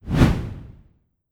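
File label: Orc swoosh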